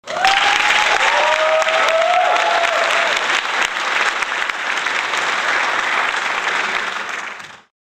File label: applause